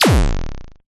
sound design digital synth hit blip thud; zapsplat

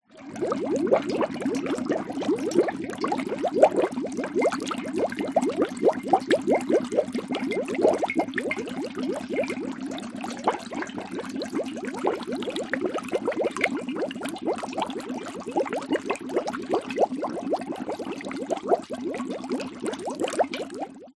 horror cauldron bubbling; zapsplat

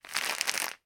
packaging plastic movement; sound effects one

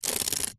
household hairbrush scrape bristles on wood

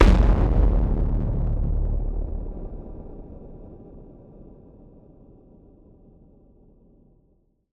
Impact Boom Distorted; bottlerocket

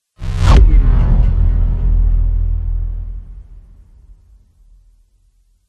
spaceship fly by; george gazetis

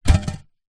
industrial shovel metal drop or set down grass

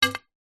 sport pool cue base tap hit hard ground

